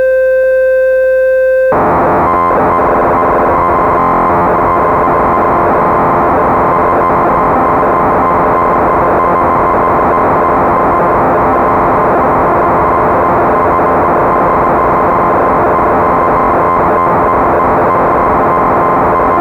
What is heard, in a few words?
Crumar Bit One